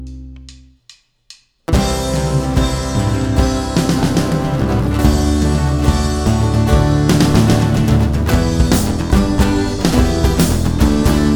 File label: acoustic version